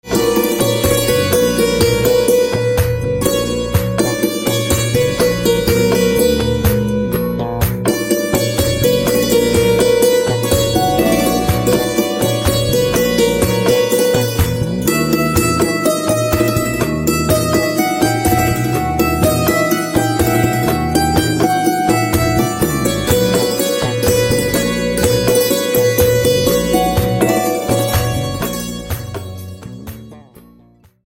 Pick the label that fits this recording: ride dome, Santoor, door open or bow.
Santoor